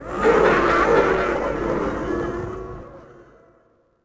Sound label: walla cheer; tusken; Star Wars Obi-Wan; XBOX